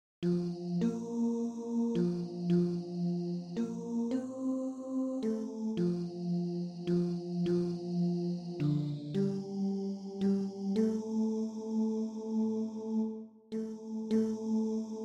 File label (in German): Mittelstimme